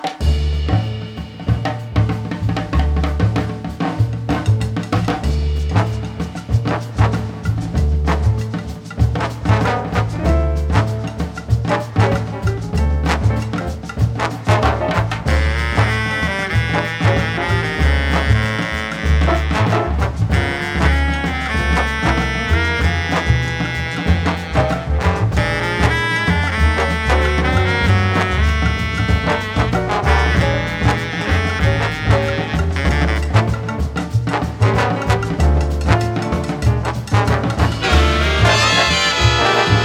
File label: Gentle Forest Jazz